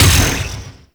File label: Ship Dual Cannon Moa Shot; Warframe; Weapons; Corpus